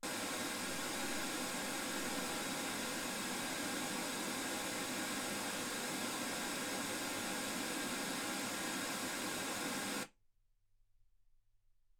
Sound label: wgn